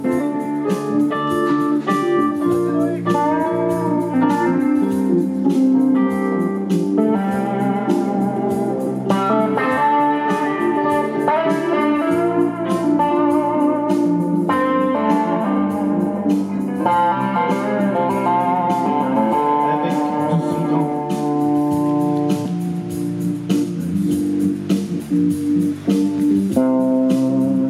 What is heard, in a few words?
renepiano